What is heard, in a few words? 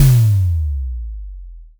sci-fi impact